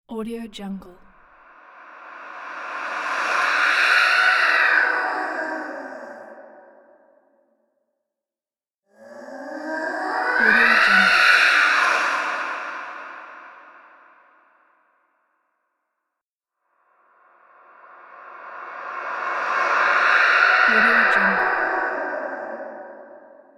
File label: horror ghost voice stinger